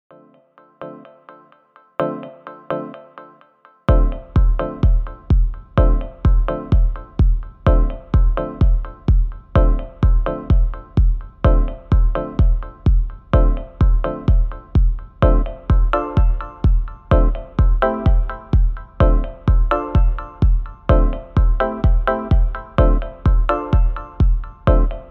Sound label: 127bpm remix